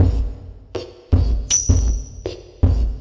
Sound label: Structured Rhythm, IDM Rhythms